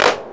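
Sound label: 909 clap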